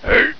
jump; ratamahatta

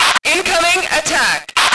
radio; lmctf; andromeda

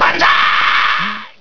jump

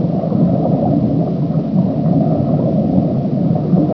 underwater; baseq2; hedge

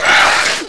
brain pain; lmctf

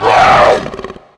medic pain; rogue; medic commander

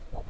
fly loop

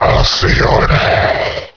death

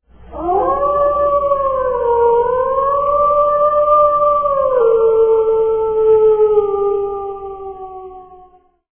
wolf

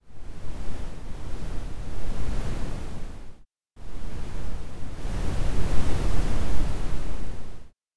wind; gothic world

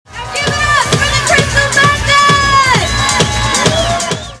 dead girl scream

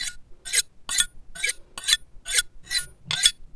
blade cut; baseq2; happyfriar

